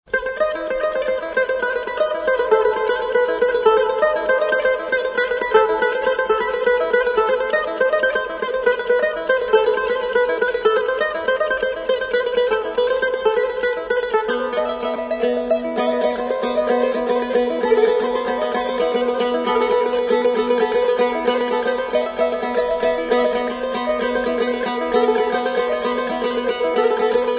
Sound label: tambura